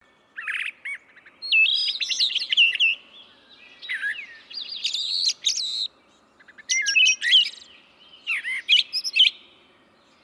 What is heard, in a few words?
Brown Thrasher, Toxostoma rufum; Active: Spring; Birds